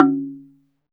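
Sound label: SLIT DRUM C#4 P; SLIT DRM1; Heart of Africa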